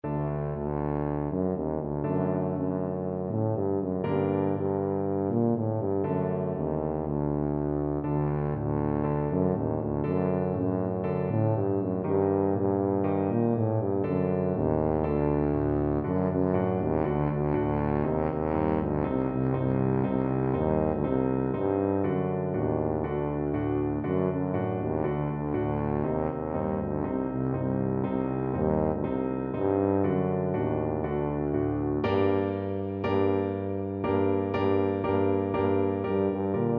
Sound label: tuba